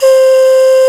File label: Brazil Whistle C4; Multisamples; Digital Sound Factory Planet Earth